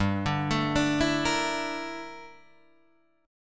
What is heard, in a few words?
G Minor 6th Add 9th G down Guitar Drop D